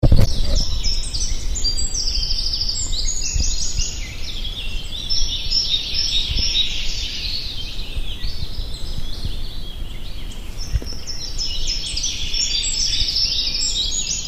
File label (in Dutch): vogelgeluiden